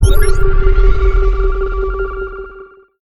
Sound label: Terran Scanner Sweep; sc2; smsalert